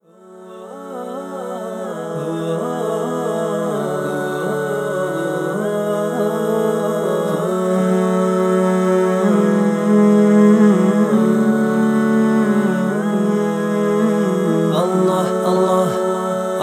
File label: Karaoke Version